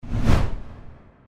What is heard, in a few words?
impact, Airsoft